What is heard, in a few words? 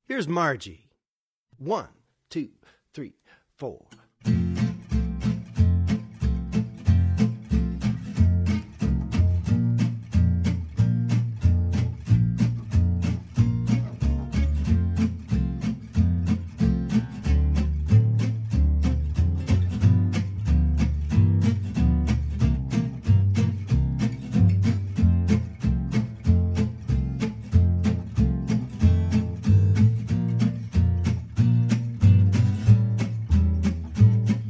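Gtr Short, guitar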